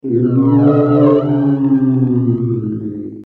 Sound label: Ghost; F.A.M.P.S; Scary